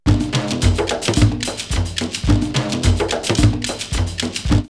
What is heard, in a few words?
N'djamena 108, drumloops World & Ethnic; X-Static Goldmine